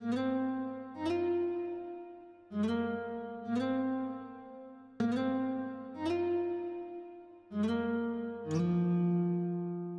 guitar

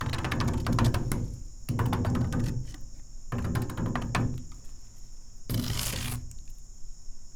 perc

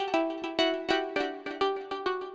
synth Liquid Keys 100bpm G

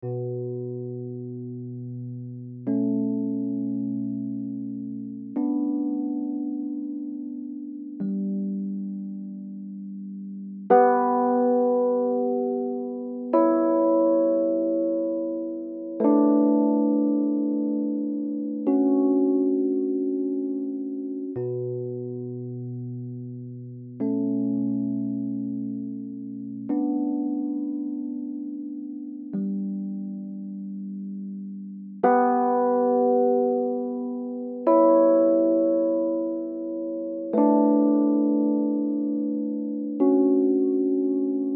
Bm 90 Piano Chords dry

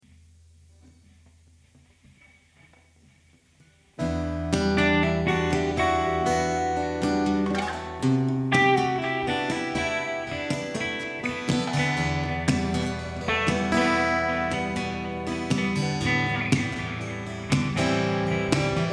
Em 120 acoustic jam wet

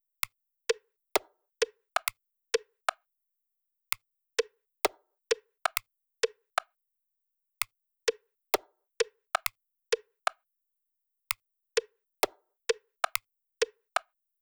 drums

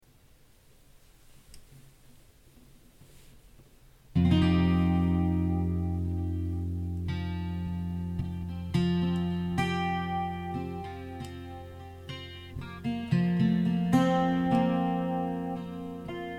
F110 Alt Tune; GRig

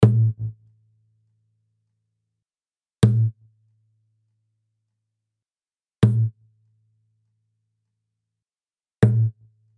Five-Four Guitar Groove

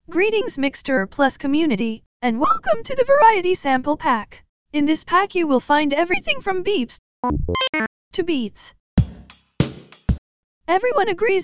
Variety Sample Pack; Mixter Plus

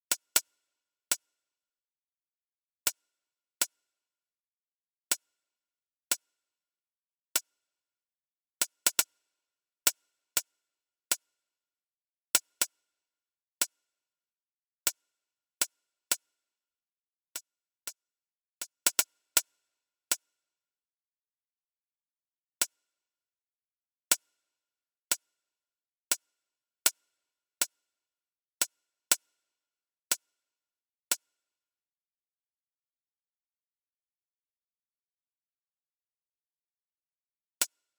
Dark Church

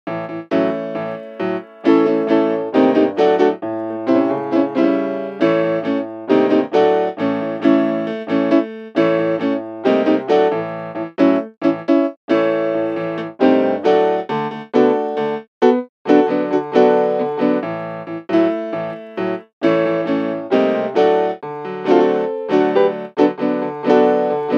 Country Rock in A 135 BPM

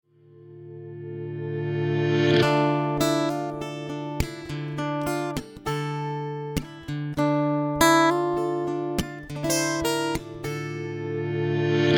Dm 100 GTR Rocky Riff

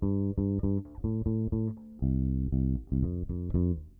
bass chorus

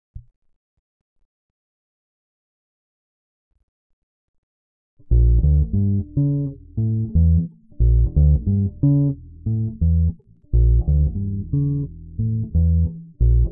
bass

